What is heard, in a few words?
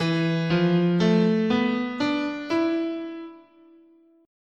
E Traditional Japanese "in sen", guitar, scale